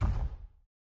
thump; Zomboid 41.78.16; Project Zomboid

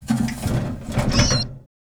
Animal Trapped wood; Zomboid 41.78.16; Project Zomboid

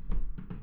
footstep upstairs; Zomboid 41.78.16; Project Zomboid

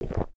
Swallowing; Zomboid 41.78.16; Project Zomboid

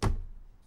window close; Zomboid 41.78.16; Project Zomboid

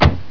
vehicle door close; Zomboid 41.78.16; Project Zomboid

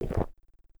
Drinking From Bottle; Zomboid 41.78.16; Project Zomboid

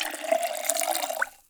Get Water; Zomboid 41.78.16; Project Zomboid

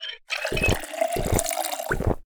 Drinking From Tap; Zomboid 41.78.16; Project Zomboid

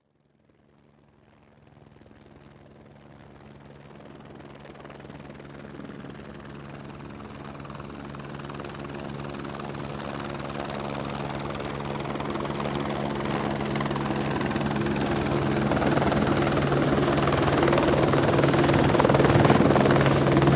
chopper; Zomboid 41.78.16; Project Zomboid

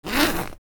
Close Bag; Zomboid 41.78.16; Project Zomboid

